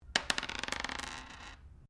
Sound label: Dice Medium Single Laminate Table Throw; Variety of dice sounds; Casinos